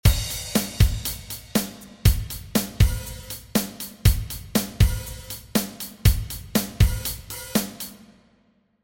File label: Drum Pattern